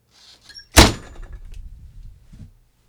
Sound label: Door Slam